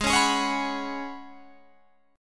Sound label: F sharp Suspended 2nd Suspended 4th G sharp